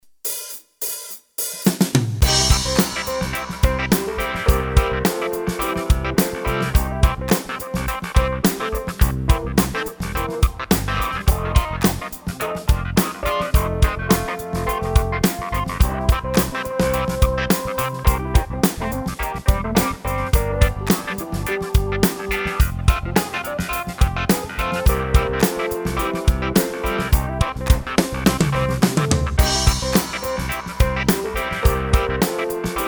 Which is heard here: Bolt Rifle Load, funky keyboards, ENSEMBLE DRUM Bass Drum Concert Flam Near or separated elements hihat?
funky keyboards